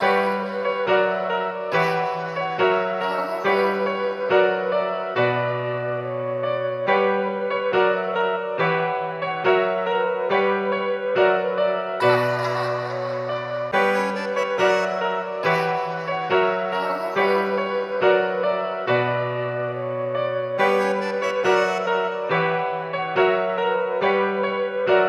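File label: 140bpm, Sad Dark, B minor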